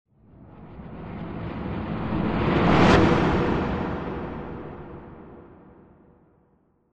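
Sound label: appearance sound effect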